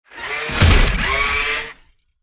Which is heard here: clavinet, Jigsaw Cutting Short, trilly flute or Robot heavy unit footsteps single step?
Robot heavy unit footsteps single step